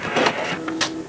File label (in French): imprime; Mobiclic46